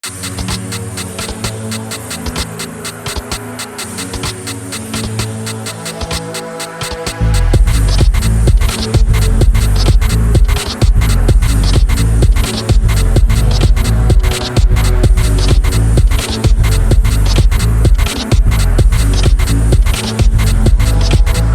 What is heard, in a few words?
I added a bass